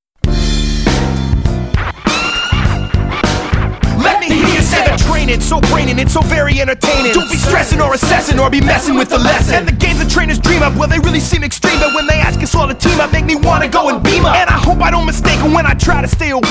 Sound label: Meeting Rap